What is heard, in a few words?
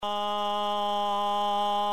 crackles, Studio Projects B1 + Behringer MIC 100 CRACKLES